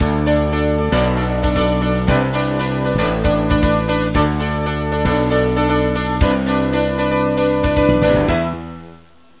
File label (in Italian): suonerie musica rock